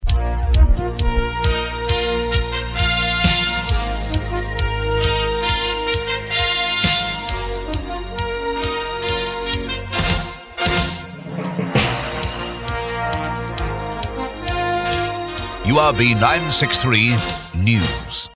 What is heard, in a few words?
news timps bed